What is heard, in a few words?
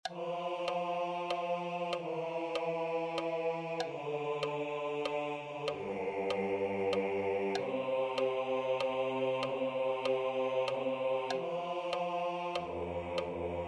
basses; mozart; laudate dominum